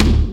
Bd Revb, Kick Drums; Zero-G; Soundclash

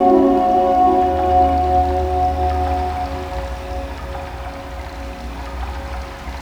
ambience

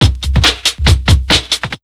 Big Beat Drumloops, 140

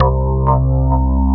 POLY TEK, Korg Poly-6

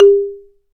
BALA, Balaphone; Roland LCDP11 Africa VOL-1; Bala & Xylo

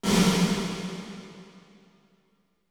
REV, ROOMS

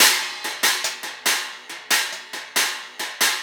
Cymbal Pattern

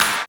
clap, hip; Best Service; Dance Mega Drums

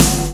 Snare OneShot, Processed Percussion; One Shots; Sonic Foundry (Sony Creative Software); Rhythmicronics

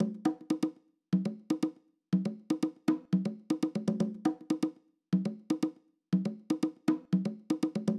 120 Conga 4 bars, Nu Jazz House; PowerFX

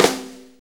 6-8 Snare Flam, Drum Loops; Sonic Foundry (Sony Creative Software); Loops & Samples Drum Tools; Flams

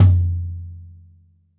bop kick - snares on; JazzFunkKit

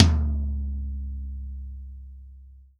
TOM, Real Drum Kit, POP KIT

